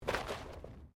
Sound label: Body movement Slide Gravel; Body movement foley to simulate falls and deaths; Bodies; Human; SoundEffects-Foundation1